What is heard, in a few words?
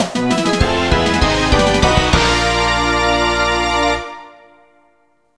Goal Jingle; Side By Side Goal Jingle; Speed Racer